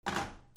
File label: PHONES